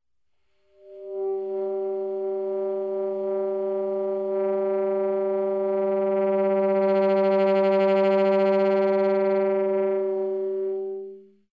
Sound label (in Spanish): Grano